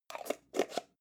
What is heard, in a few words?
jar plastic midsize close slow